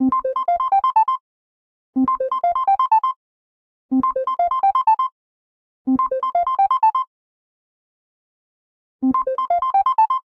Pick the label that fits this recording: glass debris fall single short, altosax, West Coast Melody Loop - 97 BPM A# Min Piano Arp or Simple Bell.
Simple Bell